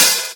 smooth open hi hat sample g key, Royality free open hat sample tuned to the G note